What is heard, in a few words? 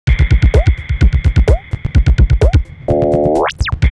Mach 4 128, drumloops DrumMachines; X-Static Goldmine